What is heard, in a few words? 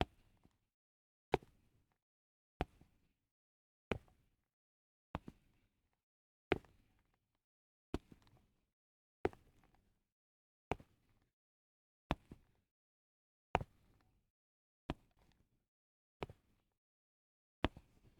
sneaking steps on road